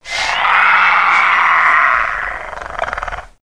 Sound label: velociraptor hiss